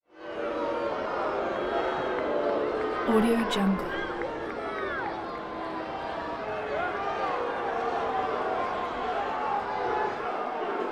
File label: wrestling crowd